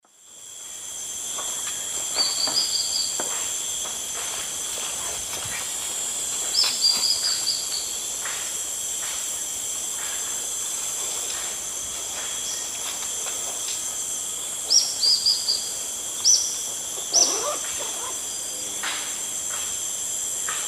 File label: Tolmomyias viridiceps, calls; S. America; Eastern Lowlands